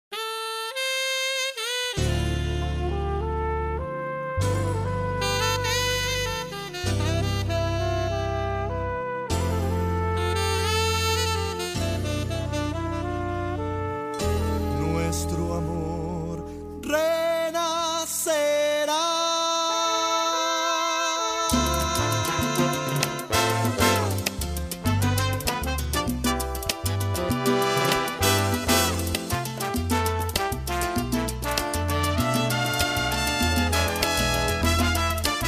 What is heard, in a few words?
Latin Fusion